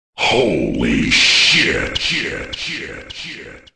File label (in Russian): zvuk shoka; donat